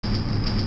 Desert Gate Start; Zelda; OOT

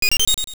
Get Item; Get other items (seeds, keys, etc.); Zelda Oracle

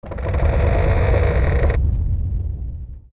Hall Twist; Forest Temple

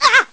Hurt; Link; zelda

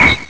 Ice Block Stop; A block of ice hitting a wall

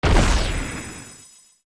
Arrow Hit Light; zelda; OOT

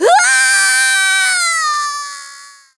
KO Far; Sheik knocked far off the stage

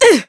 Bridge Dash; Impa dashing across the bridge toward Ghirahim; Skyward Sword

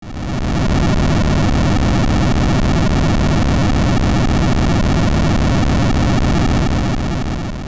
Drain; Tal Tal Heights; Zelda

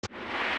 Steps Push Crawl Dirt; zelda; OOT

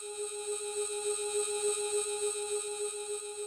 Triforce Glow; OOT

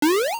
Jump; Link (and other characters) jumping; Oracle

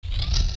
Door Stone Open

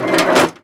Pirate Ship Catapult; Zelda

